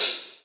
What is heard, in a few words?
HH CL AMB, AMBIENCE HI HAT